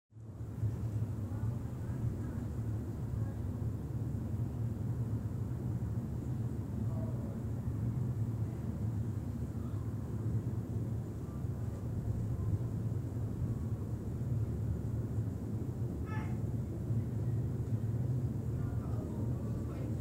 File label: Pier train voices